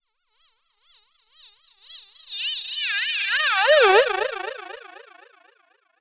Filter Proc, alarm siren samples, 120bpm; musicradar

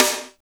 SNR ZIP SN, Snares; Roland - Rhythm Section; Snares 1; Sn Modules 1

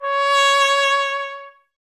BRS TP C#0, Trumpet; Miroslav Vitous

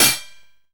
HAT SOFT OPEN, VINTAGE FUNK; Zero-G; Funky Elements

